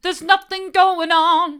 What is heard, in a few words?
theres nothing going on hard, vocal